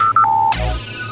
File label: forcefield on